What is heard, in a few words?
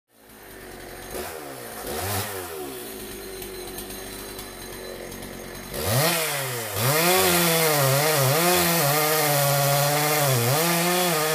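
sound of a chainsaw and a falling tree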